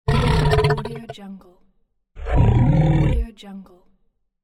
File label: crocodile sound; خرناس کشیدن کروکودیل